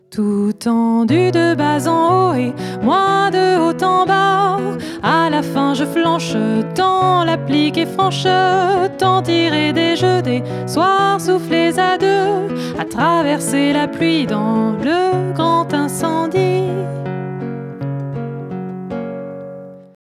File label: dry